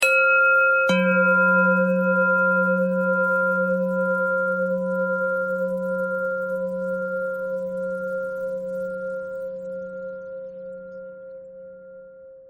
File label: bell bell thread noise